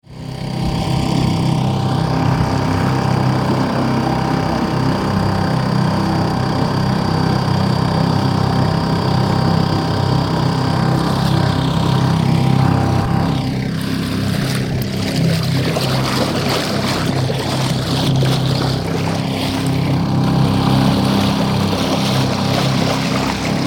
Peramoottori

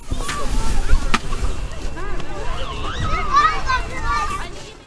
leikkikenttä

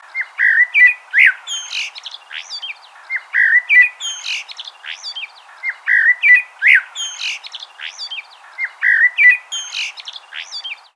linnunlaulu

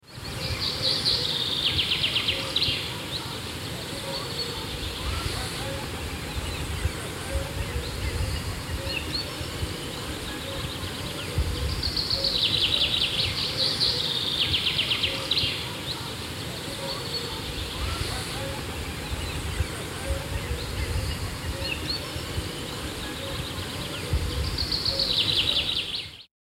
Linnunlaulua ja tuuli

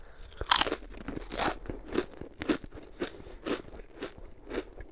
pureskelu

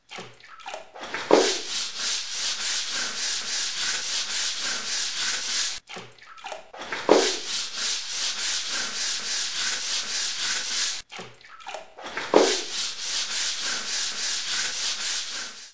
matonpesu

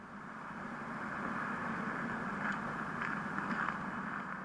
kohina

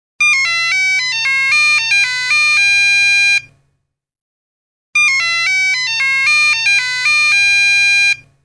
kannykka

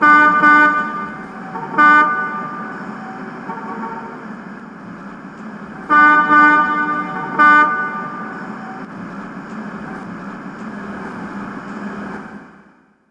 aanitorvi

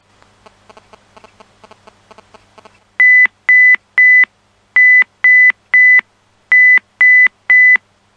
tekstiviesti

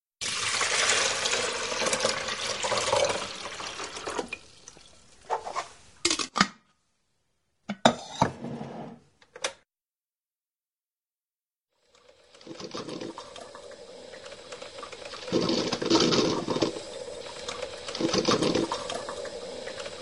kahvinkeittaminen

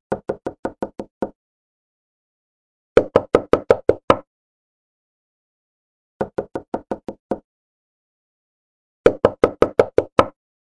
koputus

